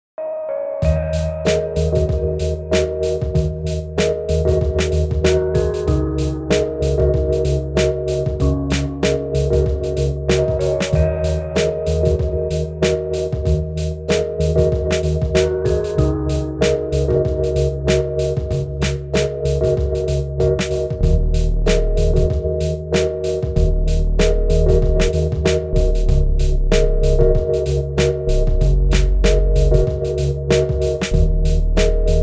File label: Ambience Pulse